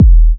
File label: Minimal Kick, Drums, Kicks